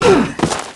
Wood Elf Death Sound; Grand Elf

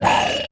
Sandworm Attack Sound; Heroes3

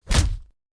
Gunslinger Attack Sound; Heroes3

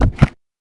Mammoth Defend Sound; HotA

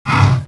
Gold Dragon Hurt Sound

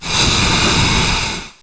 Ice Elemental Defend Sound; Heroes3

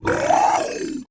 Olgoi-Khorkhoi Death Sound

